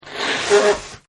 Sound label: Nose Blow; Sickness Sounds; Human